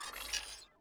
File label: Focus Out; Tom Clancys Ghost Recon Advanced Warfighter; XBOX